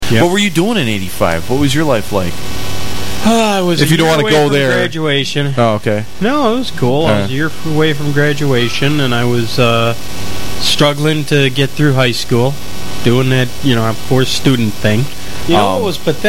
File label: Beep